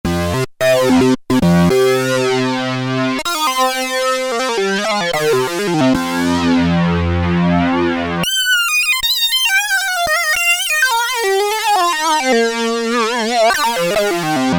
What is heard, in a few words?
ROCK UNISON, Oberheim OB-8; Factory Patches